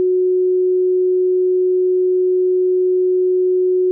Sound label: Aircrafts Cockpits Beat Tone; DCS